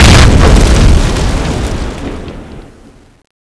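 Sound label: destroyer explosion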